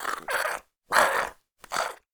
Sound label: idle, zombie; DayZ-Epoch; dayz sfx